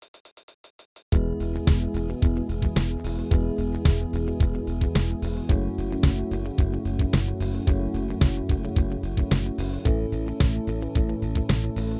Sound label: Straight Rock Light Rock 110